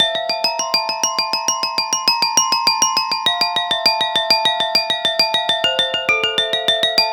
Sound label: Pelog Demung Hi; Melodic; Ambient-Melodics-II